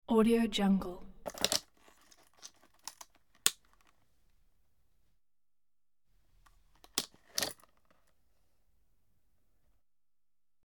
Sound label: unholstering and holstering handgun; sound-ideas